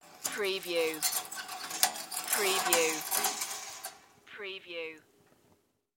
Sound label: Fireplace Curtain